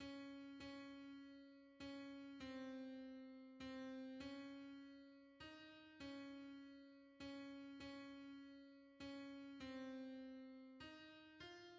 hymns, alto; Oh what a debt we owe

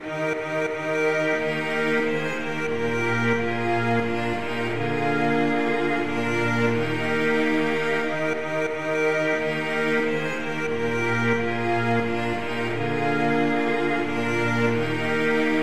polyinstru, hymns; Blessed Lord our Halleluyahs

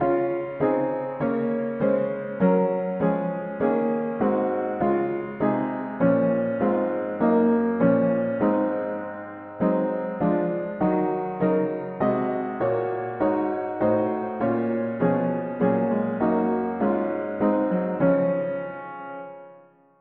mono piano; Son of God in heaven we view Thee; hymns

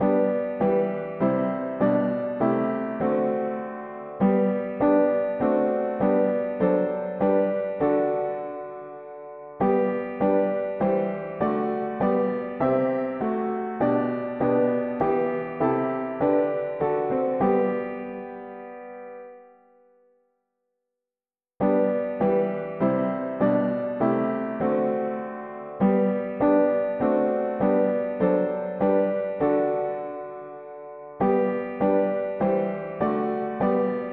mono piano, hymns; Thou brightness of God s glory

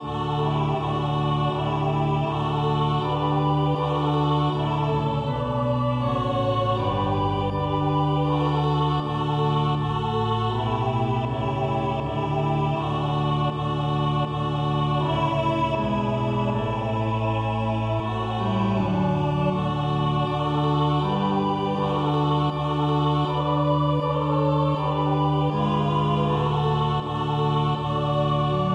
polyinstru; hymns; Lord Jesus we worship

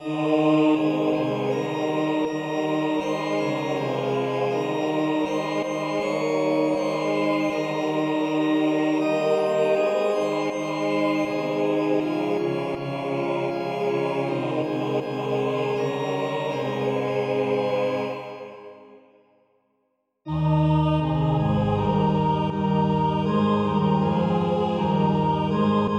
polyinstru; hymns; Well may we sing

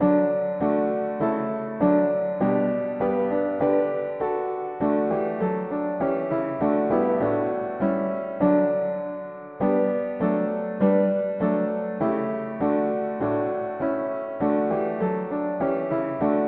mono piano, hymns; We adore Thee evermore

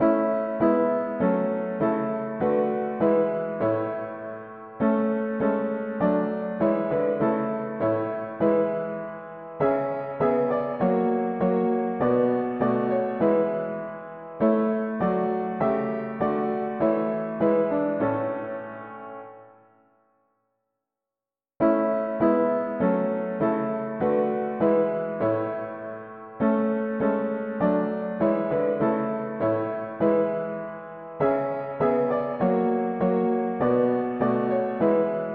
mono piano; hymns; Glory unto Jesus be

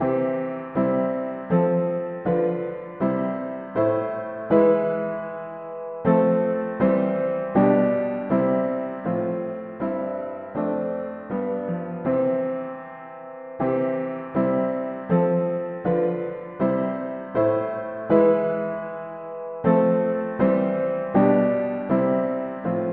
mono piano, hymns; Gloire a toi Jesus Sauveur

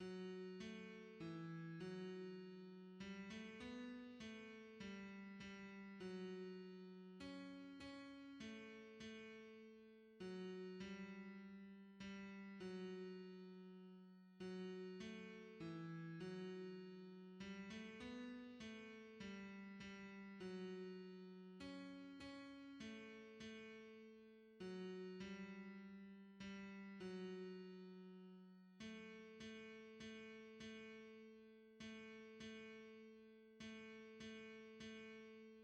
tenor; Seigneur Jesus ton amour reste; hymns

